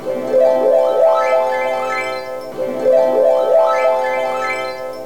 harp; childsplay plugins; FindsoundData

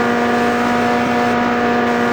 engine, cars; vdrift

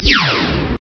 laser; blobAndConquer; combat

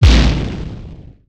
grenade; ufoai

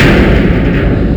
explosion; wormux-0.7.9

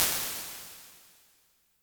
impulse hall; rezound-0.12.3beta

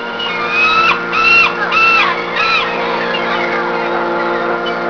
boat; childsplay; FindsoundData

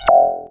spring; solarwolf

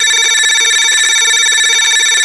computer write; blobAndConquer; combat

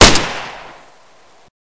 pistol; blobAndConquer; combat

